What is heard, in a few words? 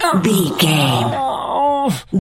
voice male death torture